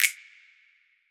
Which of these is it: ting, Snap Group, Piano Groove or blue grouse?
Snap Group